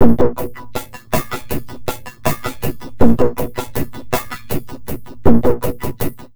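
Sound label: neuro, drum loops crazy processed, 80 bpm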